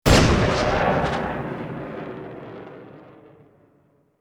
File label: Bullet Time; FXHome; Bullet and Gun